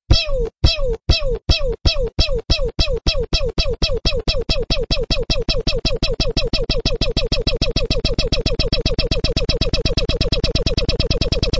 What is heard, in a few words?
pew pew peeeeww